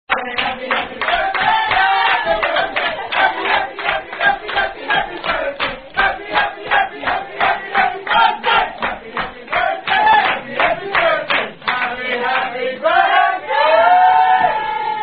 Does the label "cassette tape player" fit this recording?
No